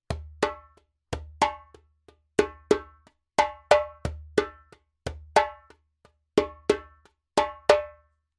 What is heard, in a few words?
djembe; djembebog oevelse